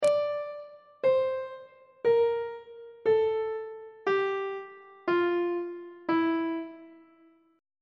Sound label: f flat locrian mode descending on treble clef